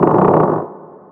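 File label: Se Dungeon Door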